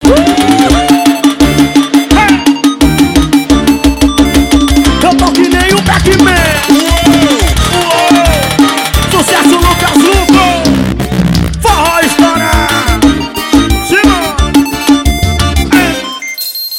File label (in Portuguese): forró estourado forró mix; em slz